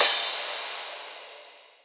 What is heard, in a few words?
Crash